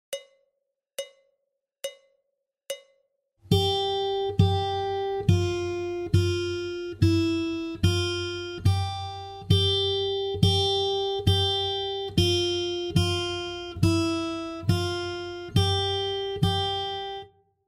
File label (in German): Warm Up G hoch, Gitarre